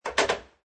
Billiard Pool Snooker Ball Delivery Mechanism In Table